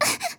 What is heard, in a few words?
damaged, RPG Voice; RPG Voice Starter Pack